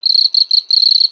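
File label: Pets Insect Large Happy; creatures; Lego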